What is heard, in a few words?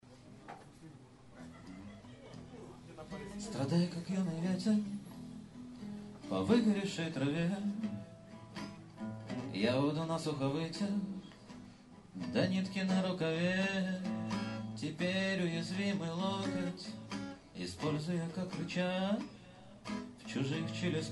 train blues, music